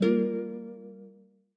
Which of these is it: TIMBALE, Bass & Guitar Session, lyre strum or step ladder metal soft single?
lyre strum